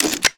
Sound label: smoke grenade; LoL; graves